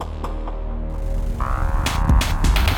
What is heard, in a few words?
Forest Battle Intro